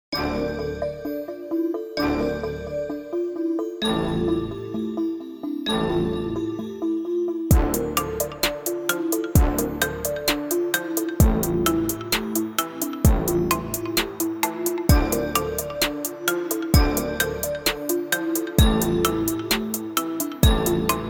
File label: Trap; Trap Supreme Kit